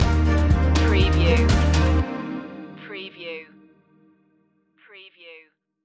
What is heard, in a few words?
spanish summer stinger